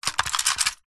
science fiction steampunk mechanism metal click activate